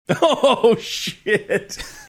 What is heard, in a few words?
laughing saying oh shit